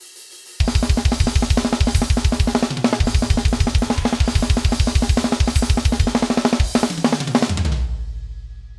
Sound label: basic blast variations